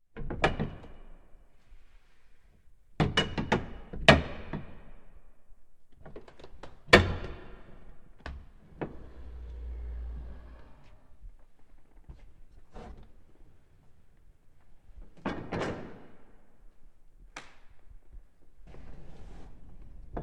Church Door Large Open And Close With Various Latch Moves; Miscellaneous Door